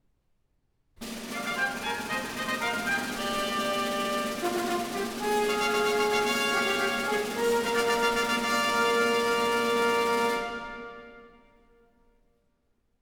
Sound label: Fanfare; Incidental Music to the Tragedy 'King Lear' by W. Shakespeare, Op. 58a - Fanfare No. 4; DG